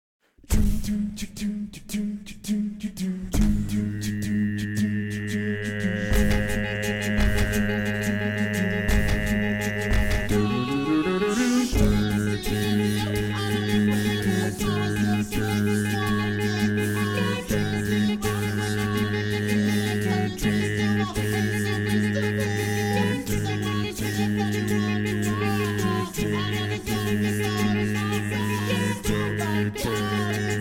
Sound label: Bass Left